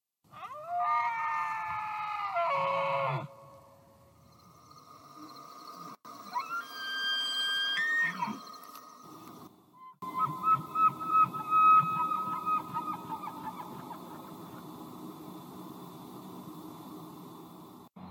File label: bugle, cervidae; ambient